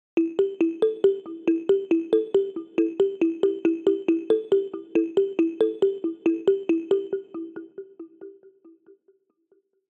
doubler off

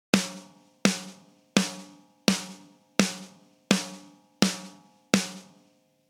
snare on

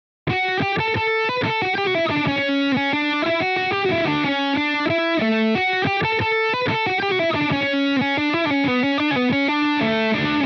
guitar solo meta filter off

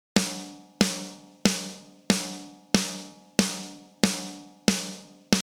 drums snare on

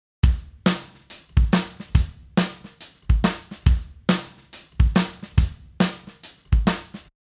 drum on

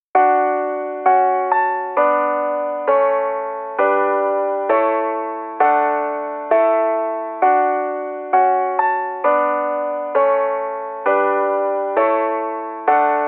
lo Fi

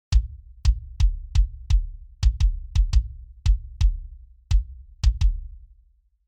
Kick On

